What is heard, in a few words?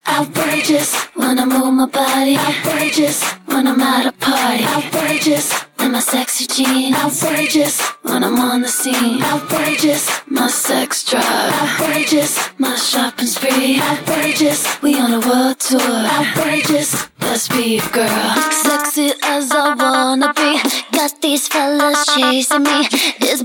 Acapella